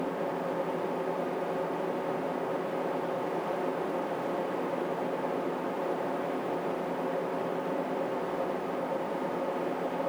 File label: 100 Fan